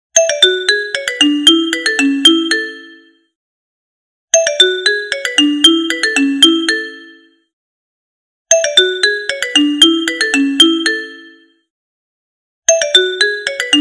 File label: Apple iPhone Nokia Ringtones